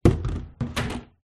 household mop bucket plastic empty fall over on hard floor